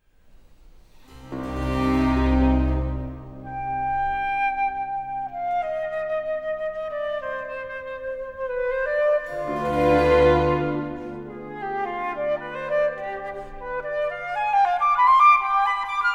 Concerto, Adagio e staccato, Oboe & Orchestra; Amabile belta; from Organ Concerto Op.4.2, HWV209; Handel For Oboe & Orchestra

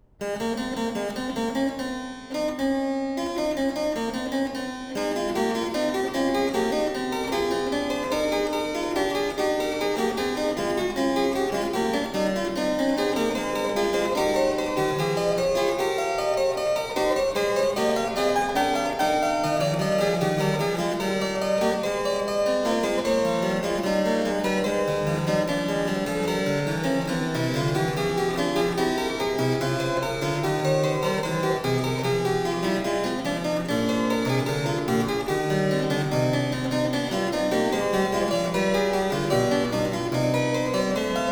Fugue 19 in A; BWV 888; Bach - WTC - Tilney

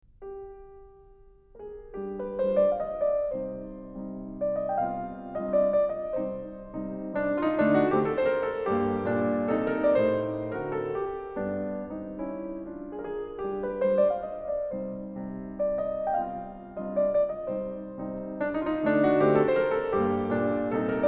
Mazurka in C minor Op. 30 No. 1, The Piano Works; Chopin; Mazurkas

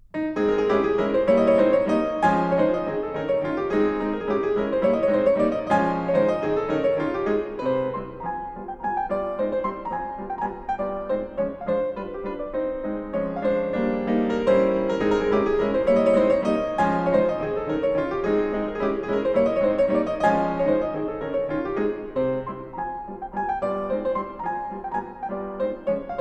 Mazurka in A flat Op. 7 No. 4, Piano

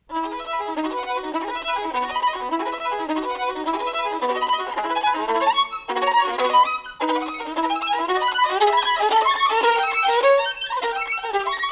Caprices for Solo Violin, No.1 in E major; Paganini - 24 Caprices

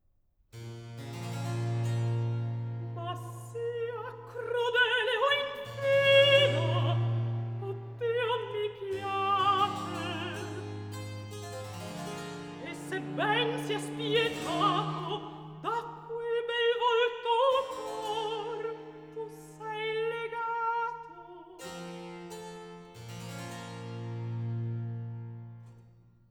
Cantata - II. Recitativo; RV 670 'Alla caccia dell'alme e de' cori'; 'Ma sia crudele o infida, oh Dio, mi piace'; Antonio Vivaldi (1678 - 1741); Vivaldi - Concerti e Cantate da Camera (2002)

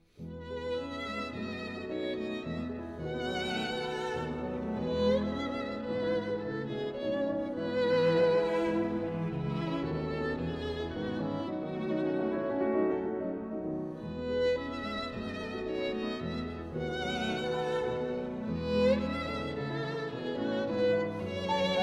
Piano Trio in A Minor, Var. I; Tchaikovsky Arensky

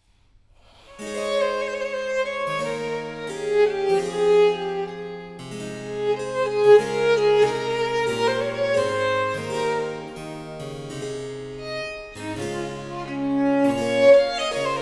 Violin Sonata in A major, Adagio; Op.1 No.14 HWV372; Handel

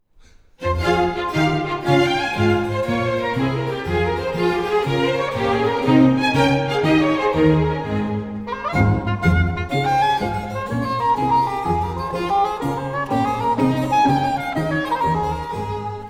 Country Dance in G, Oboe & Orchestra; Water Music Suite No.3; HWV350; Handel For Oboe & Orchestra

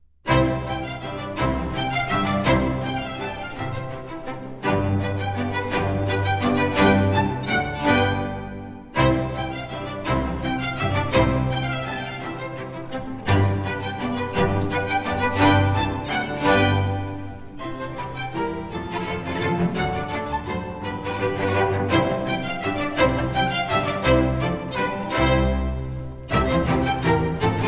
Sinfonia, Minuetto; Antonio Vivaldi (1678 - 1741); Vivaldi - Griselda (2006)

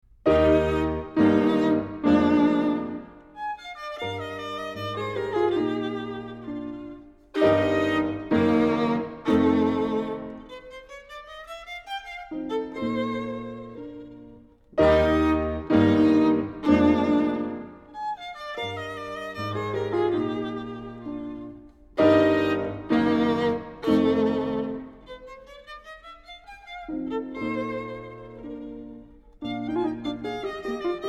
Violin Sonata in E flat, Variazione; Mozart; K481; Variazione IV

